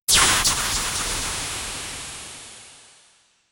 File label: shoot long web; superhero; spiderman